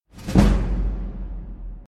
Skill Level

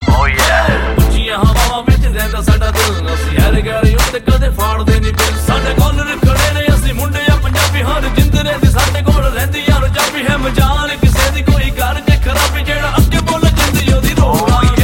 Punjabi Rap Sms

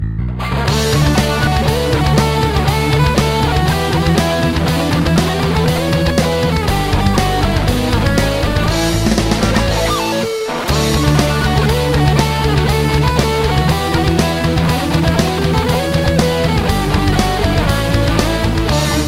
Rock; Doremon; meloboom

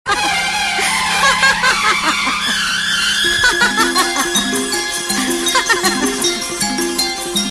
Laughing Lady

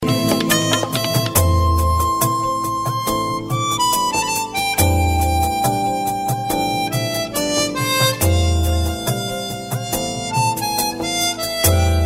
Music

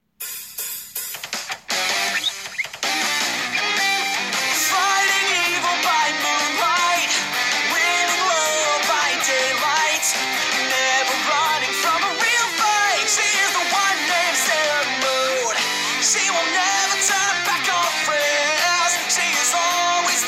Metal

